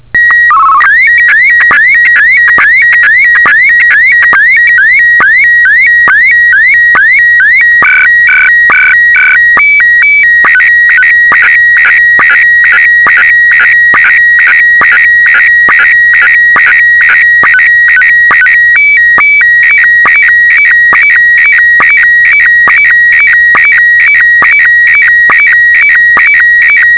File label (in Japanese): SSTV, HQ1; 約９０秒